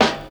Good Snare D Key; snare drum samples

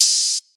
verby open hat one shot D# key

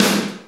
acoustic snare g sharp key; sample; Duration 0:01; Samplerate 44100 Hz

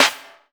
Rich Top End Snare One Shot D Key

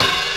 smooth open hi hat one shot F key

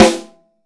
low tone snare drum sample e key

